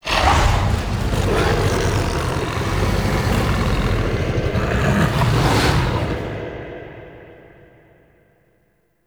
Idle Noble Bow; Warframe; Voruna; Idles Noble

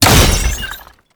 Scan Impact; Frumentarius

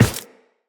Run Default, Footsteps; Tenno

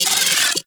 Arachnoid Micro Vocal Attack; Warframe

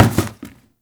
Carboard Box Impact; Ambience; Gameplay; Warframe; Nineteen Ninety Nine

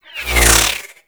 Cluster Shot Whizzby; Enemies; Abilities; Warframe; Teralyst

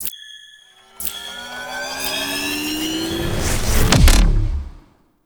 Recall Charge; Railjack

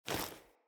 Female Foley Action Default Melee Swipe; Player; Female Default Clothing

